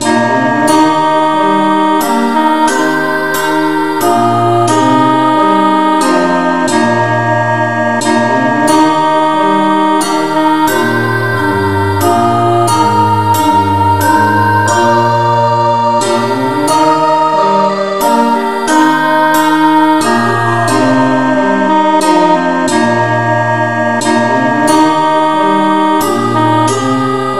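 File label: no vocal key E flat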